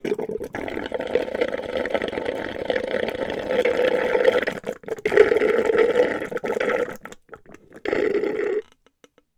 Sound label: Obnoxious Sip Straw; TS Sound